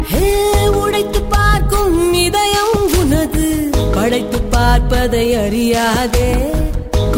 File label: Sad Song